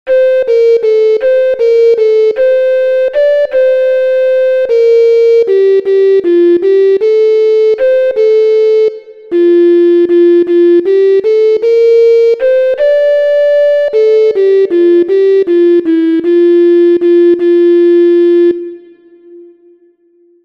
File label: MIDI